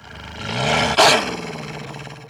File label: wolf ride; mir2; crystal